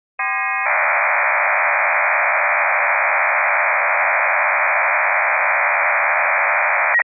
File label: ofdm